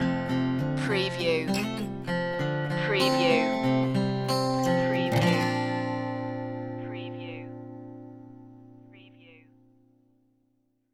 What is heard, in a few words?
mellow morning stinger